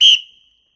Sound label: Sfx Basketball